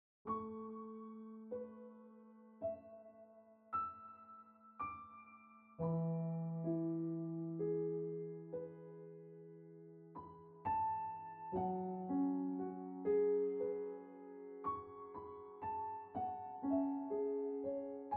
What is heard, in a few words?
Piano Music